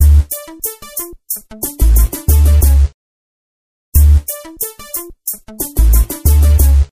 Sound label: Sms Ring